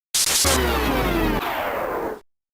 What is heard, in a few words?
stat down